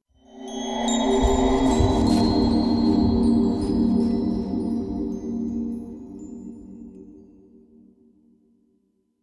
Vine Bridge Grows

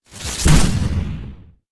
Bio Plasma Shoot

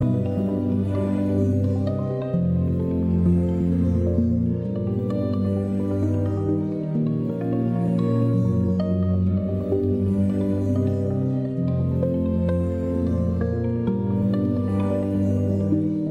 Fantasm Forest (Conversation)

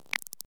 Creatuer

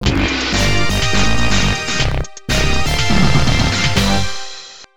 Loser (Game Over); mutant fighter arcade gamerip 1991

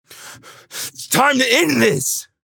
lever; devour 2021 gamerip pc macos

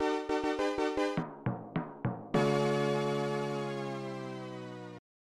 Unused Jingle; Rap Basketball; snes

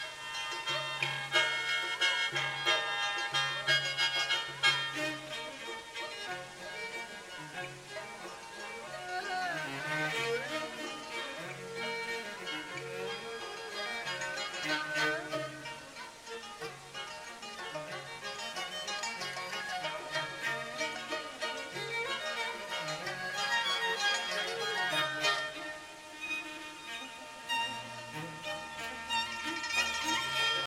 Market Music; escape from paradise city

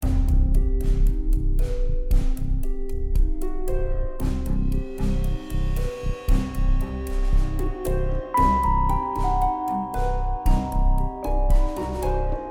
Explore 115BPM; Disney Infinity 2.0 Marvel Super Heroes

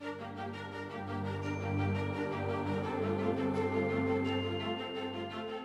menu intro loop; EvoCreo; Android game music

